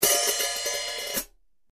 HH ZBT 14 RPR 14, rock hat

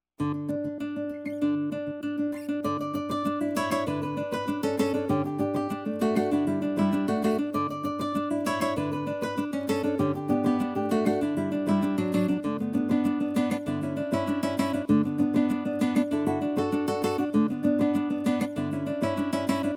2 Guitars

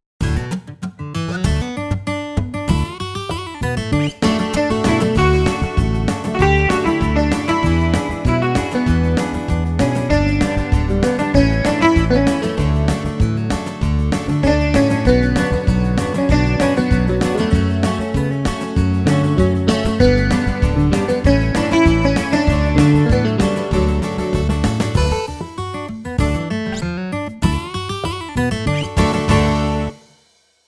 Pop Country

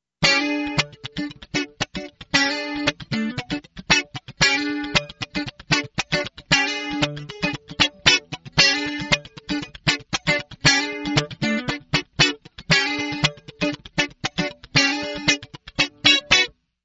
clean cutting

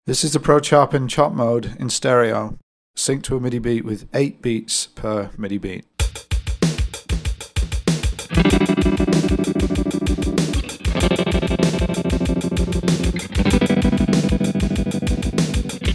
8 Chops per beat; GFX PROCHOP

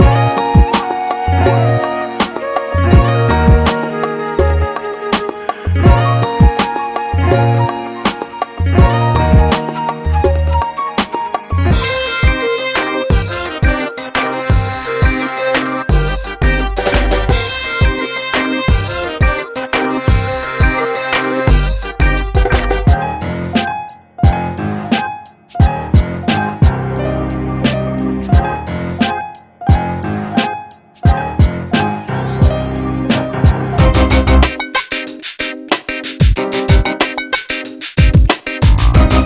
Hip Hop RnB

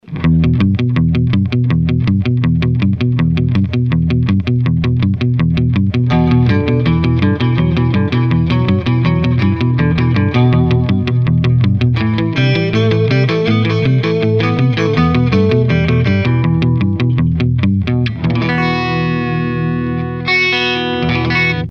surf clean

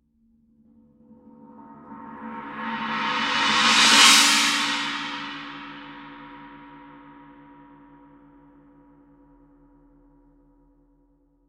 china roll

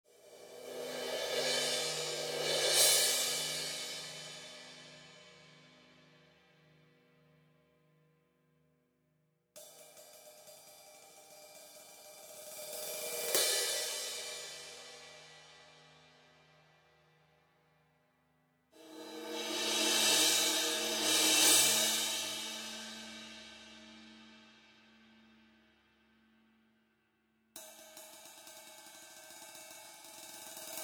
cymbals mix

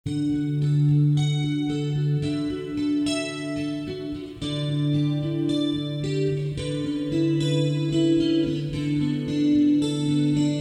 STAGE AG; GFX-8

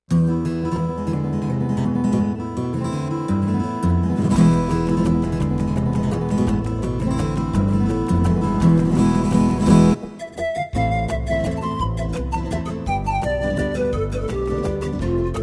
Flamenco; Tyros Style Pro

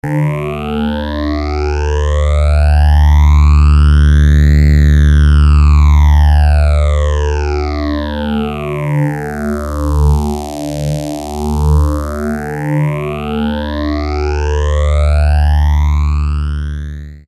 sawtooth 12 stage

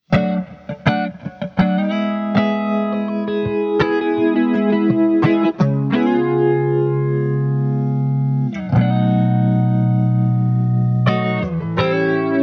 leslie emulator; GFX CHOPPER

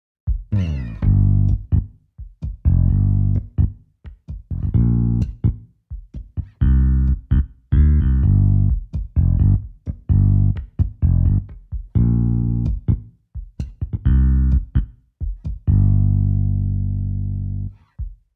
Finger Bass

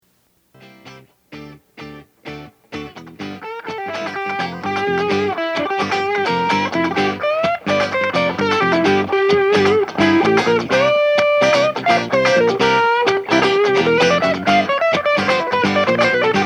Blues solo

